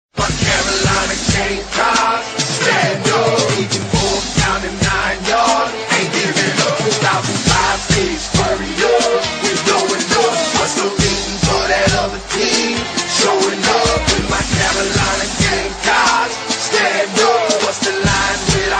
Rap; Usc Gamecocks

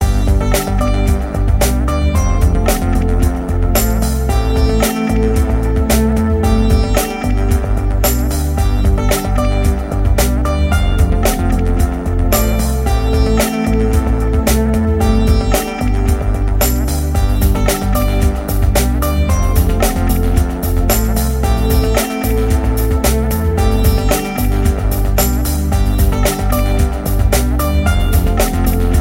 India Ambient

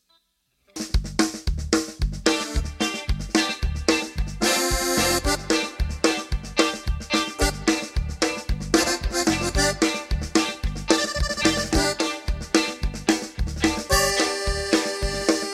Tejano music

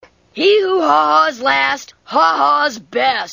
Haha

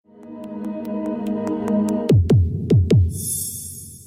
Audi Heartbeat short

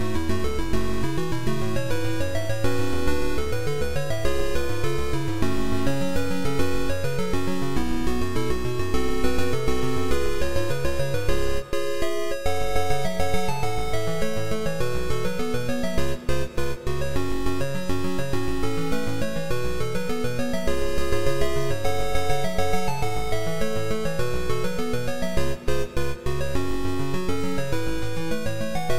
8bit; Danganronpa

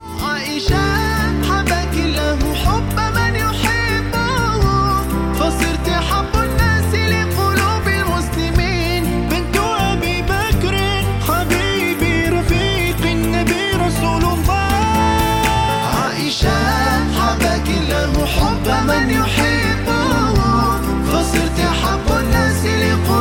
ARABIC

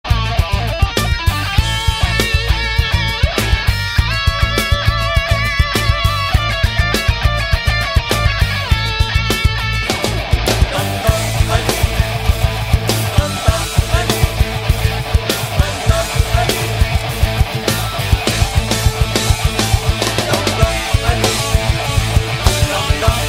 guitar heavy drums

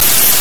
Hahah

